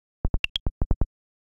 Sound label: diode; Nothing Phone 3; notifications